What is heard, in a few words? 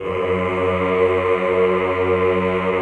CHO F#1, Ehh; Eeh + Ehh